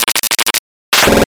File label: thunder attack